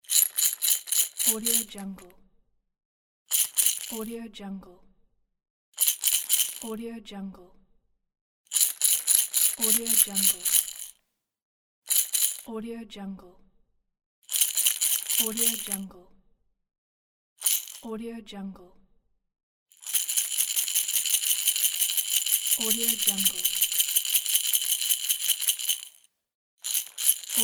childrens hand toy maracas

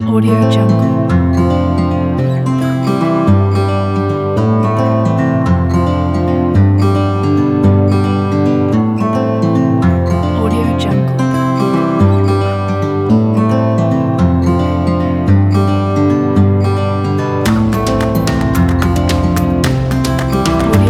positive acoustic indie

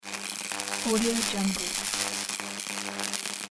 electricity

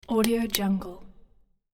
light switch pull chain; hollywoodedge

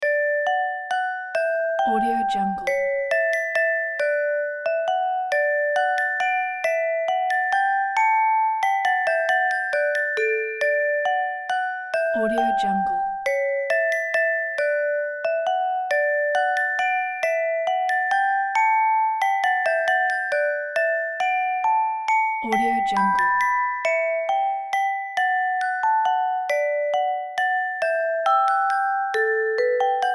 baby musicbox

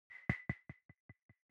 ui button echo resonance noise

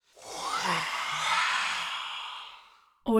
choral wind exhale breath eerie; prosoundfx

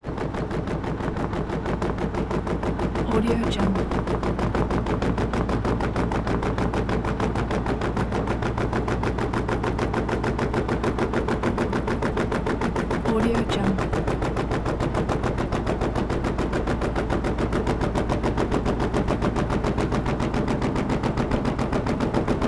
bus constant; prosoundfx

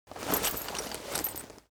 cloth leather metal handling short; foley; smartsoundfx

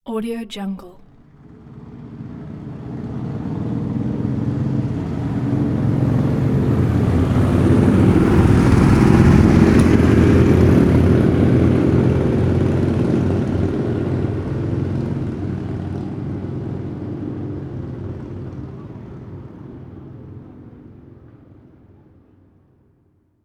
rattling motorbike choppers passby

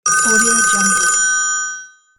old phone

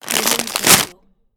open bag of chips

